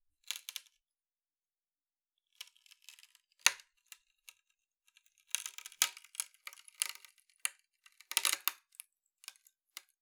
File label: Palette, WoodenPalette